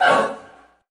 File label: "oh" sung